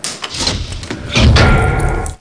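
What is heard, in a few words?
elevator stop; Nukem3d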